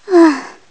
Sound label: Sigh; Zelda (spirit form) sighing